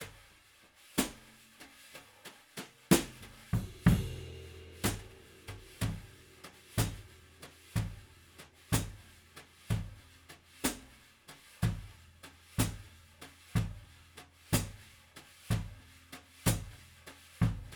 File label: OHs; TubeTech